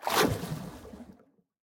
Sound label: enter, ambient underwater; Minecraft; Votive World